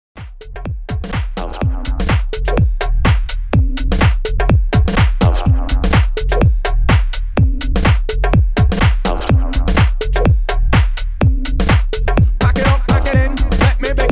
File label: Tech house Mashup 125Bpm